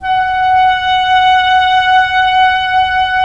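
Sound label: wind cor anglais; LA Composer vol. 3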